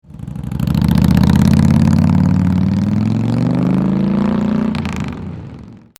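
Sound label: TAKEOFF, motorcycle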